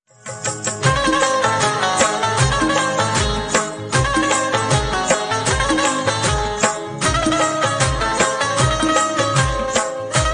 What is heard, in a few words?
music ringtone